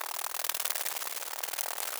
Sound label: hornet idle large